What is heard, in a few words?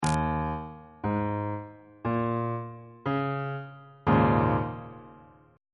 e flat dominant 7th suspended 4th chord